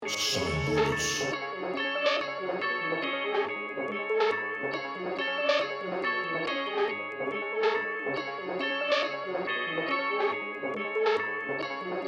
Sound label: beat; SHANKS DOWN CHALLENGE; SOUNDBULLETZ